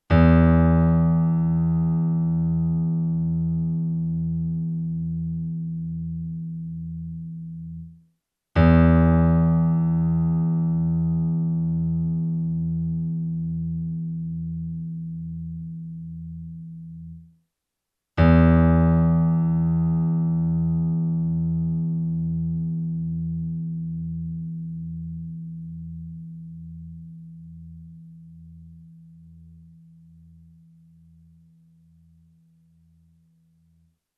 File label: 6th string E